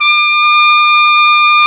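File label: piccolo trumpet; Roland L-CDX-03 Disk 2; Picc.Tp 1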